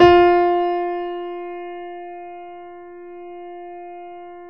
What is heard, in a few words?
F 3, Keyboards Acoustic, GRANDP ST; USB Soundscan vol.17